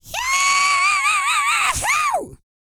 YELL, MALE HUMAN; Spectrasonics Vocal Planet

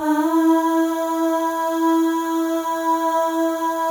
AHS, FEM; Spectrasonics; FEM.1 AHS

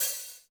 Korg 01W Dance Kit; 300 Drum Machines

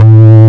DIGITAL BASS, BassGuitar; AKAI S6000 CD-ROM - Volume 1

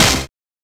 Orchestra Hit; Genesis

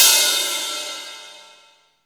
ICE CRASH, CYMBALS; CYMBALS 1

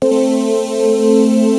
100 BEES; Best Service Dream Experience

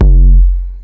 DUTCH KICK; Masterbits; Soniq Elements

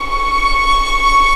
ANG.V C#5, ANGELIC

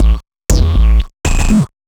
Dirty Rumble; Sonic Foundry (Sony Creative Software); Underground UK House & Electro

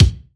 BD, BASS DRUMS; EdgeSounds

